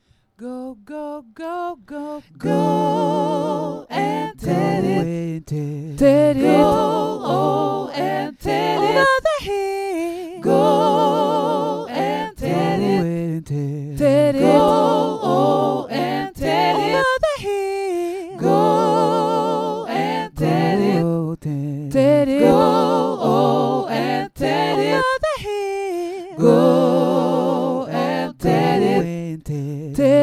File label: ad lib go and tell it